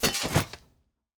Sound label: Shield Unequip